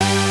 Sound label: synth